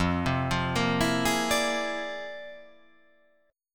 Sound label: F Minor Major 13th; arpeggiated; JGuitar